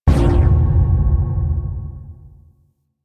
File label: Gauntlet Power Punch; Warframe; Weapons; Gauntlets